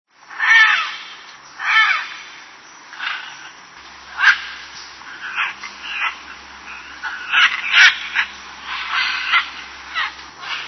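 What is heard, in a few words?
macaw, birds on the Costa Maya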